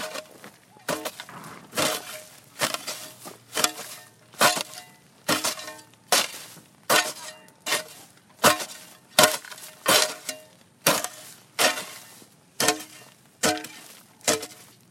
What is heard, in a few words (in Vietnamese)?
Ông chú cuốc dất Ploughing